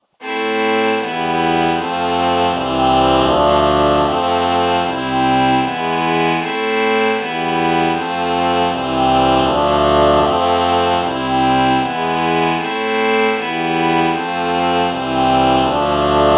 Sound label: ah violin chord morph